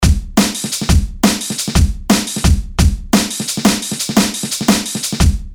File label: Break 174; BANG